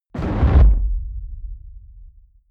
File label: heavy sub noise bass